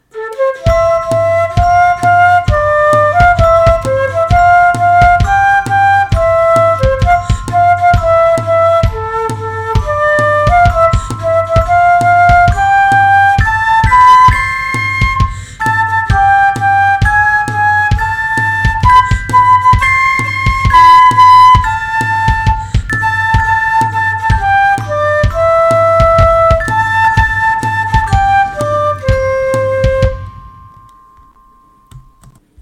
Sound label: ringtone